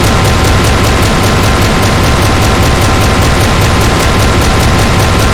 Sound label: flak gun shoot, weapons; tf2classic